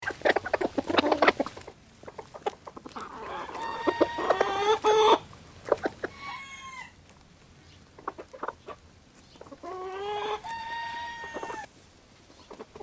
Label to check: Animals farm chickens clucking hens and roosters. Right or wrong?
Right